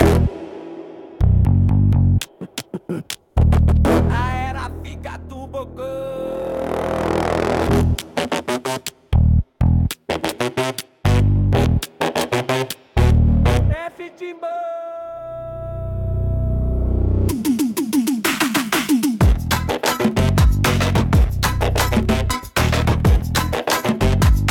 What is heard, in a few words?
phonk musics